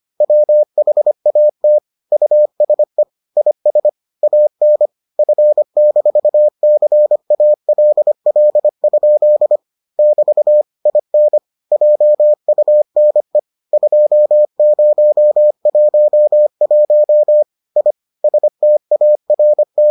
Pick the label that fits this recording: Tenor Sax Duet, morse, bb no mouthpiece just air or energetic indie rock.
morse